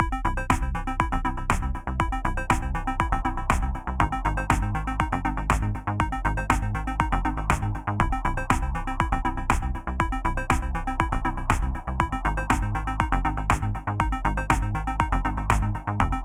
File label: Arcade Puzzler, LoFi, chiptunes